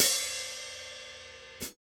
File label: K3 Op Hat, drum